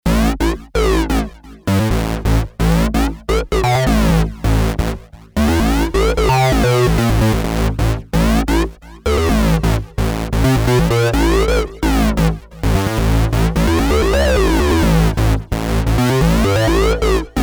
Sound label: sh 201 osc sync lead